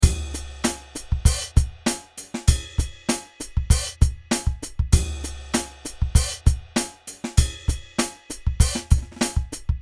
beat

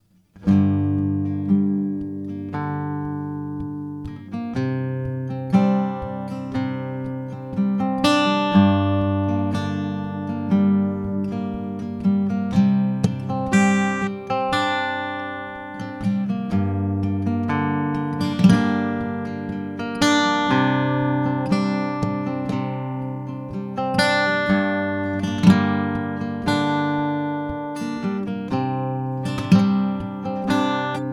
Gm120 GTR chords

